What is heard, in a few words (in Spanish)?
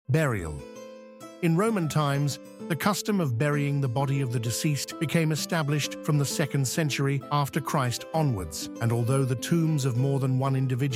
música; archaeological museum audio guide